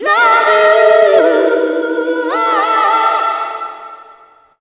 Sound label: YOU AHAHAA, Funky Vocals, SHOUTS; USB Soundscan vol.18